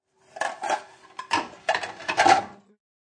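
Stack Plastic Tupperware, The sound of plastic tupperware being stacked; Kitchen; Household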